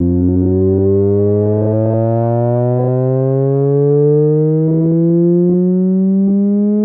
SNTH BASS TEKNO 140 A

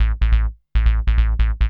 TECHNO BASS 140 A

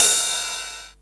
909 RIDE, TR-909 DRUMS

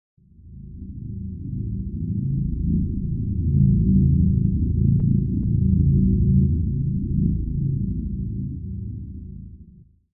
SPACE SHIPS; SpaceSounds

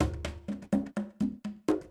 PERCUSSN ETHNO 125, Drum

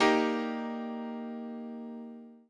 PIANO 96 G; OLDSCHOOL; Keys

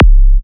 Minimal Kick, Drums, Kicks

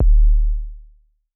G#1 Pure Tuned Kick, Tuned Sub Kicks; One Shots; Touch Loops; ElementsOne; Abstract Rhythms

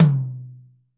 dry tom, acoustic set; drum

